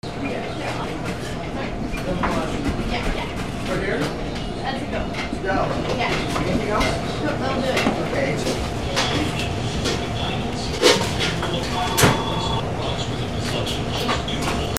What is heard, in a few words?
AMBIENCE